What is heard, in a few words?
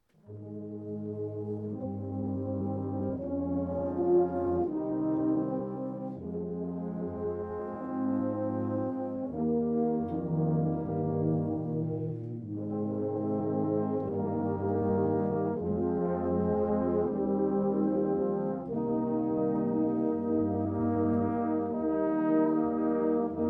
Andante Spirituoso